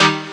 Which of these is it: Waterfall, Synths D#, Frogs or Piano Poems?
Synths D#